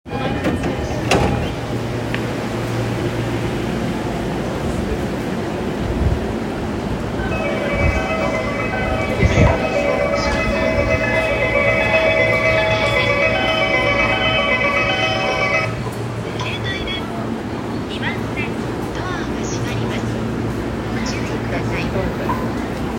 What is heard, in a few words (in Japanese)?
東海道線 大船 北, train sounds